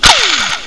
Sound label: SPACE GUN